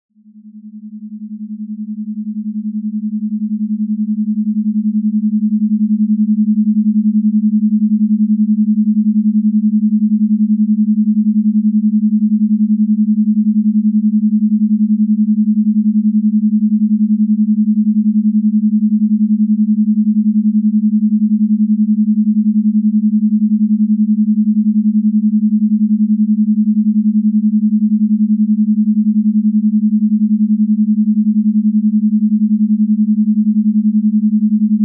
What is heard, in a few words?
Binaural 9Hz, brainwaves